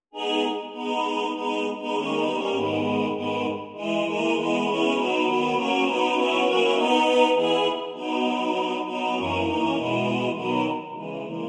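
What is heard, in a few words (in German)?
alle Stimmen gleich gewichtet, Männerchor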